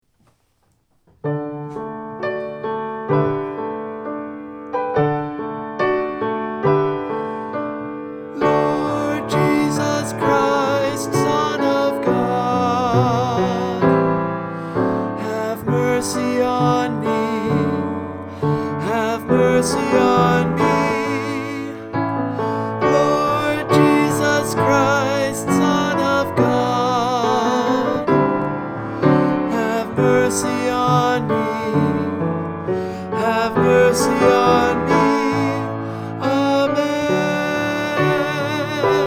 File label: Harmony, Alto & Tenor; St. Anthony of Padua - Choir Sunday